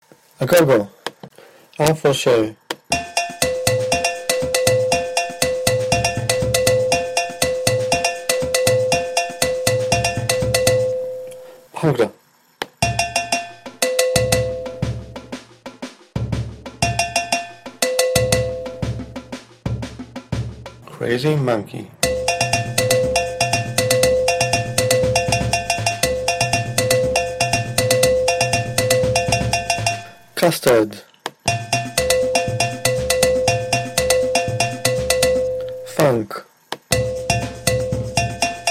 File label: Agogo